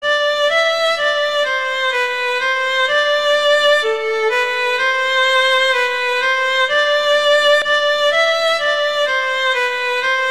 Violin Solo; London Bridge

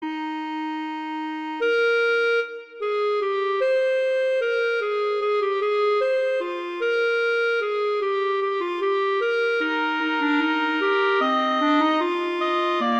Fugue; Bach; Prelude Fugue VII

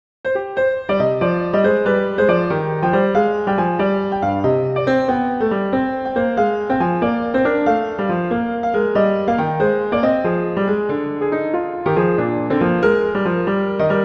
Gigue; Bach; French Suites; Suite II

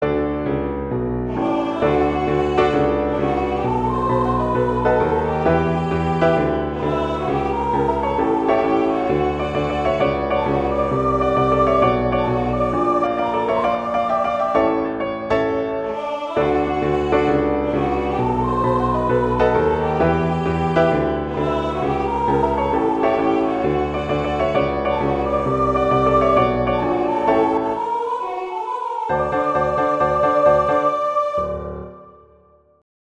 Piano-Vocal

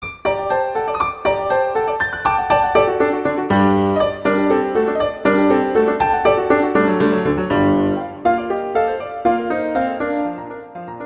Halling; Grieg; Lyric Pieces

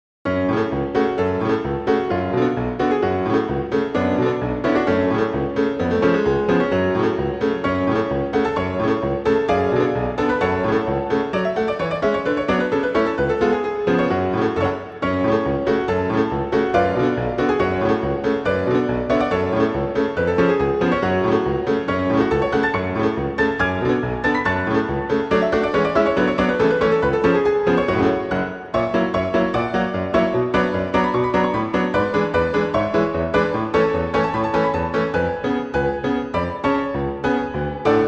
Allegro; Brahms; Hungarian

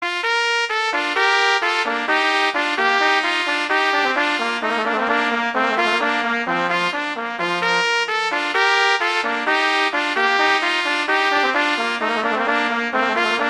Allegro; Telemann; Canonic; Sonata 1

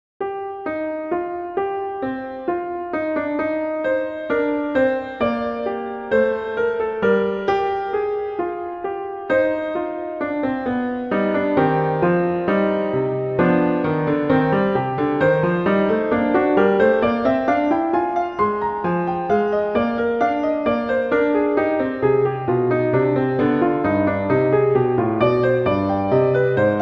Fugue; Bach; Prelude Fugue II

